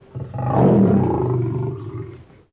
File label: Lion Fidgt Vox; Civ4 warlords